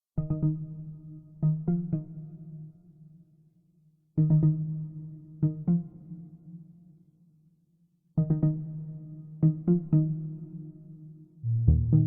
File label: Old simulation music